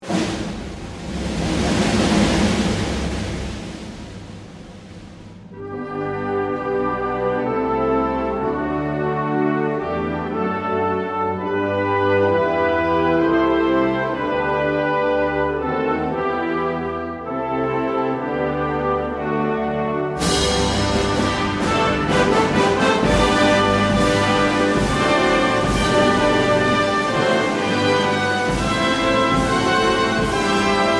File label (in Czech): hymna; Frýmensko